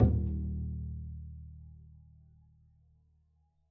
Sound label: pizz eb1, double bass; dsmolken